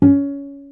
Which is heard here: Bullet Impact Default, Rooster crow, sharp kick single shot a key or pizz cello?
pizz cello